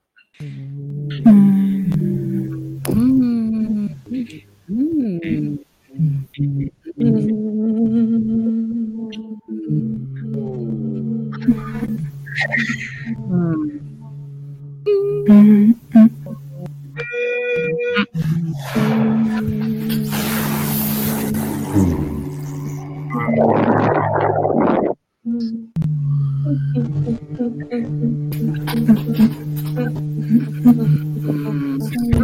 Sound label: hum